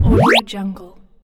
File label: whoop game sound